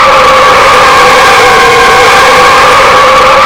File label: tires high; vehicles